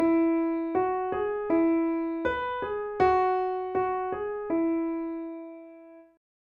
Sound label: melody